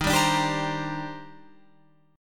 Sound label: D sharp Major 13th D sharp Guitar Open F, chord; D#M13; strummed